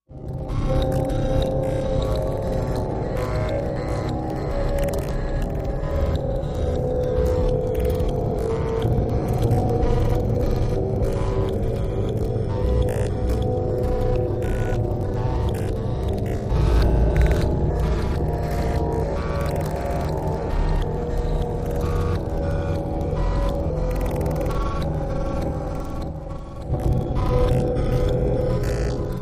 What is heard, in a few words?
Deviated Spectrum, Machine, Multi Layer, Robotic, Echo, Buzzing